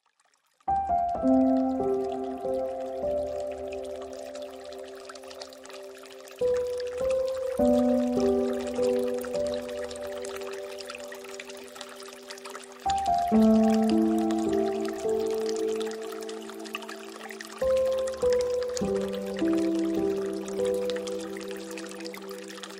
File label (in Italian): piano acqua